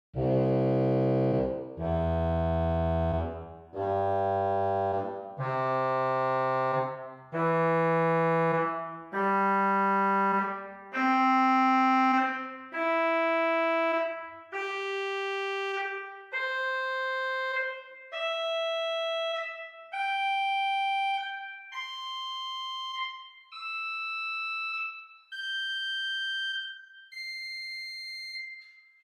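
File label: Trumpet 8 arpeggio, organ; Hauptwerk; St Annes Moseley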